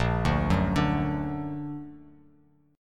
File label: A sharp Augmented; 6,5,4,7,x,x,x,x